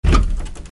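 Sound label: Buick door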